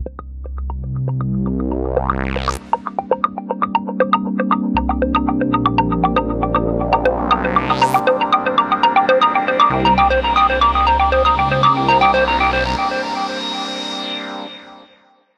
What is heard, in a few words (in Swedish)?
ring